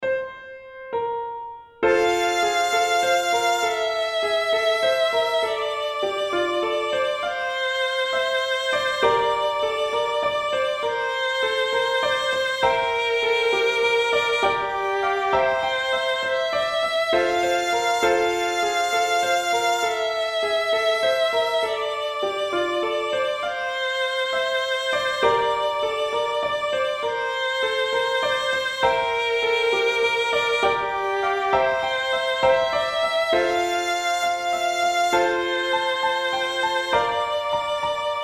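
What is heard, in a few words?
quad melody and harmony slow tempo; Partie de Quad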